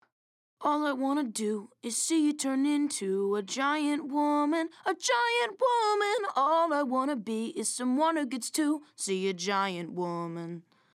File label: Ringtone Acapella; Steven Universe Soundtrack